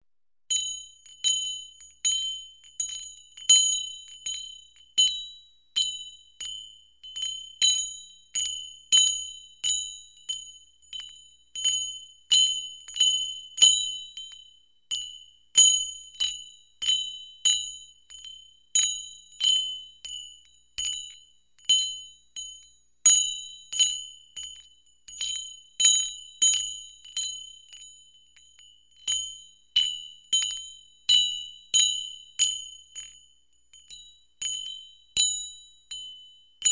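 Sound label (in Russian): звон маленького колокольчика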